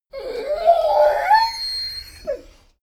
dogo argentino whine sequence; natur-tiere; hunde

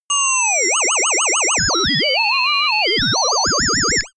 ufo communication; pulsieren

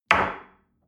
espresso place on table medium

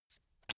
playing cards flip; comedy-cartoon; spielzeug

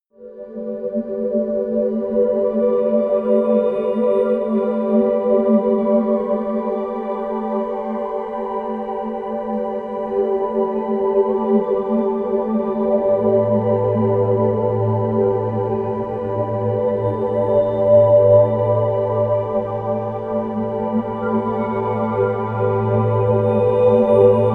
under water, music elements

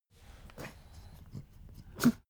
pug smack gag; natur-tiere; hunde

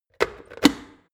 plastic water boiler lid on quick; maschinen; wasserkocher; soundpool

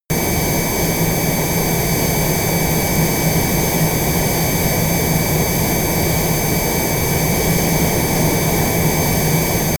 plane cessna private turbine full power direct; flugzeuge verkehrsflugzeuge; verkehr transport

